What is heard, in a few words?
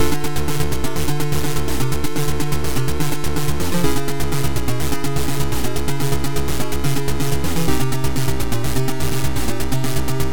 Game music; Wopgamma